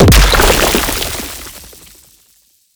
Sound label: Limb Death Dissolve; Enemies; Warframe; ManInTheWall